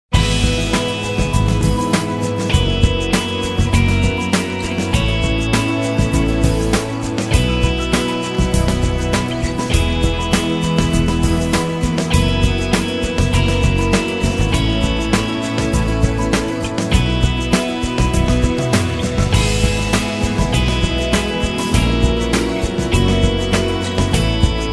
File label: Music Sample; On Hold